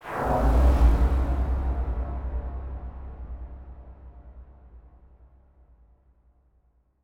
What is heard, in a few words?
Warm Blow